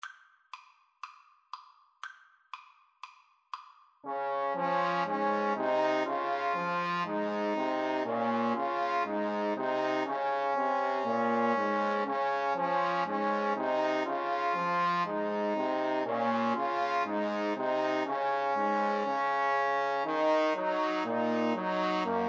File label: trombone trio